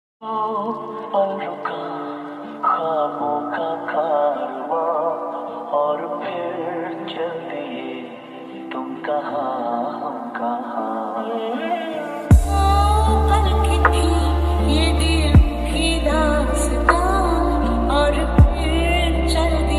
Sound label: lofi ringtone slowed reverb instrumental ringtone